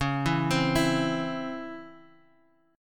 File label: Db Minor Major 7th Db